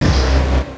beam start

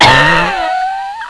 choke; dmc

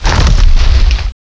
tree crash, jungle; gearbox

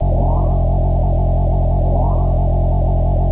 hum; tfc

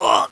pain, male

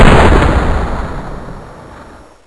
uzi fire; weapons

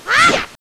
boomerang

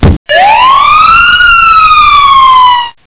gold grab

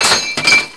metal; gearbox; debris

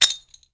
spoon; firearms

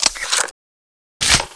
reload, weapons; brainbread

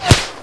pain shot; firearms

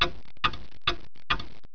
clock

